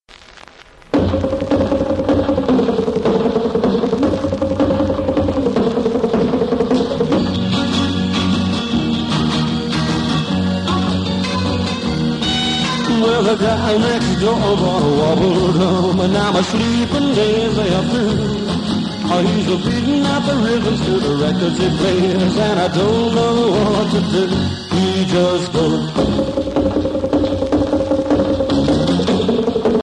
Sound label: wobble drum sample